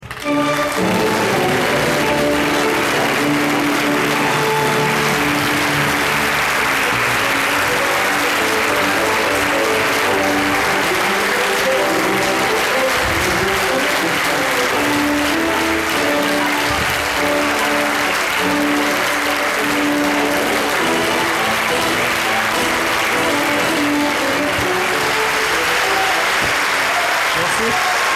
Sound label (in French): Musique de fin; Humour; Desproges, Pierre; Pierre Desproges se donne en spectacle (Théâtre Grévin)